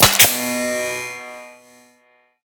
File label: Power Cable